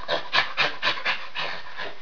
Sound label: chimp; Master Clips Email Animator